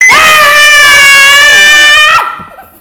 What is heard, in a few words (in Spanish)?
aaaaaaa gritos gemidos